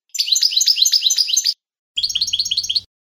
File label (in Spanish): tableteos, campero